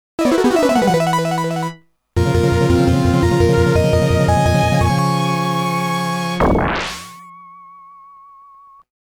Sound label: Start